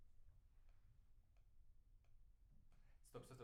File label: PIANO